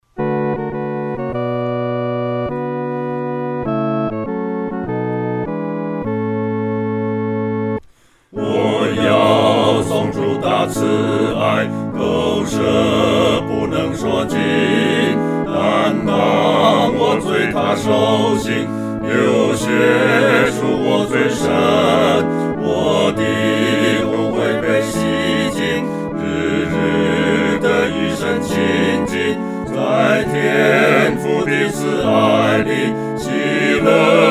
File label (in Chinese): 合唱 四声部; 颂主大爱